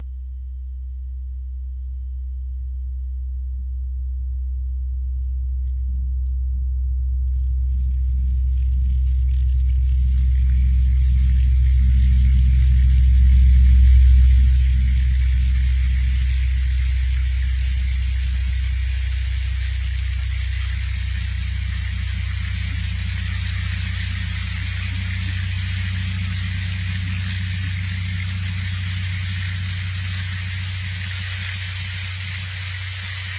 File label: splash